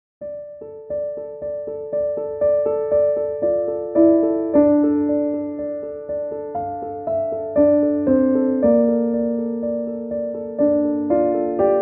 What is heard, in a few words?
Clean Piano; piano orchestral